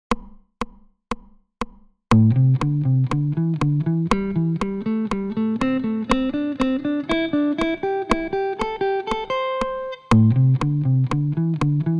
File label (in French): Vers l'aiguë; objectif solo 15 jours pour apprendre a improviser